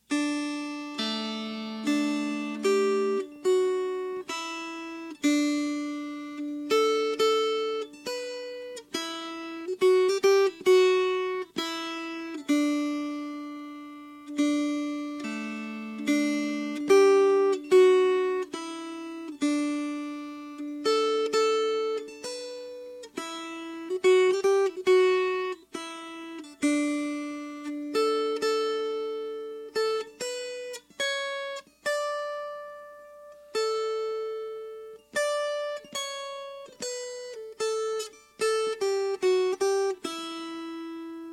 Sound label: Melody; Ma'oz Tzur